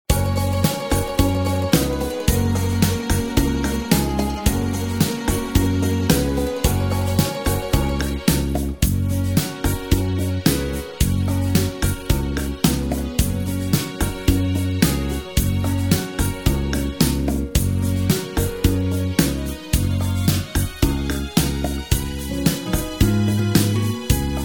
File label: Gb To G No Backing Vocals, backing tracks